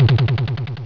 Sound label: Harmony; Sapiens 2.0